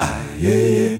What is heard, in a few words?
Male Voice, African Voices; Quazibeat; African Secret; Male Vocal